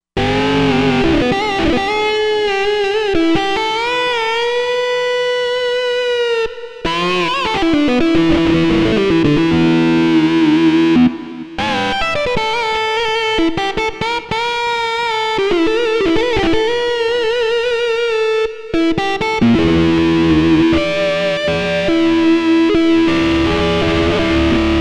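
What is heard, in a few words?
Guitar Lead; Moore Lead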